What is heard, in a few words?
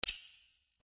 Sword Sound; FXHome; Fighting Sounds